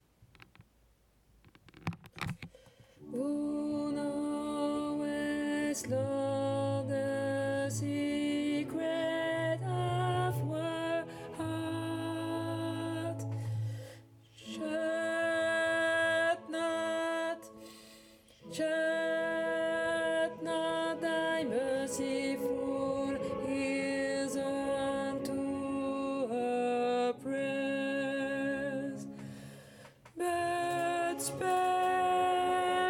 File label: alto; Thou knowest lord; henry purcell